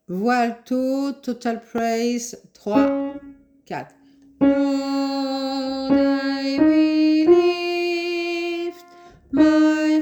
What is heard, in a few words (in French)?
voix alto entier